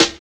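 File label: SN, snare; X-Static Goldmine 1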